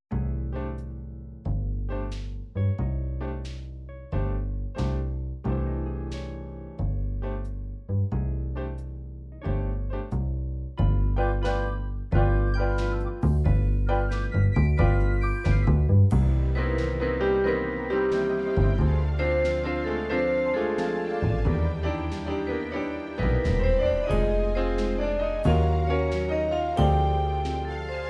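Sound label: ballads ballad orchestral ballad, midi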